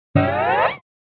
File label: silly springy bounce